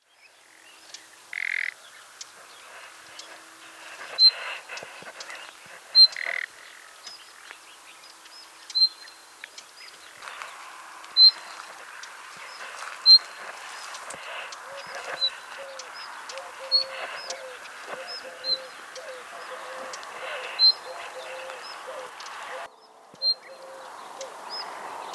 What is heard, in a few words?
Luscinia megarhynchos; SETE